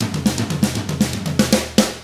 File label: ROCK